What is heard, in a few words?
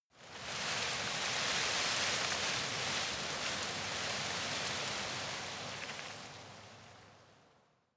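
tree leaves rustle soft, garten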